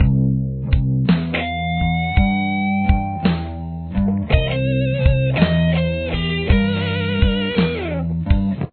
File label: lick, guitar